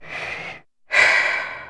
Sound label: girl breath